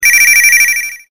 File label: cellular